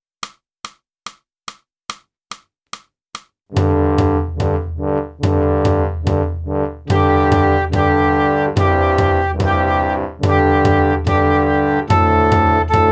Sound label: flute; Boosey Woodwind Method: Flute (Book 1)